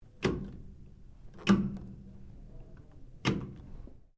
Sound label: SWITCH RADIO TURN, Sounds onboard HMAS Castlemaine; Boats; Vehicles